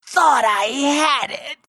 meepo death

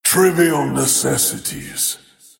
attack pain; void spirit

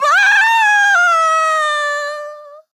falling; Paladins; Tyra

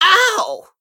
pain; Awesomenauts; Snork Gunk

